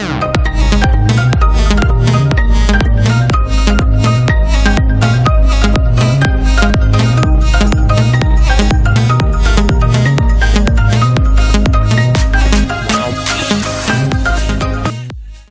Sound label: Infinix Ringtone; Infinix Zero 8i